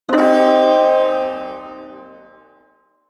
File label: Shawzin Pent Min Chord D; Warframe; Duviri Ersatz Shawzin